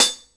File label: Hi Hats; InVision Interactive; Lightware VOL-1